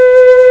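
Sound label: FLUTE; CMI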